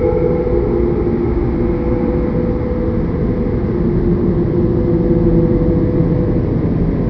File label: pipe hums